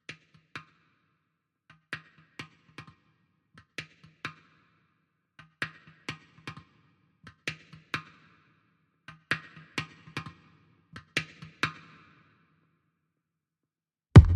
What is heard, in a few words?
Drums and Bass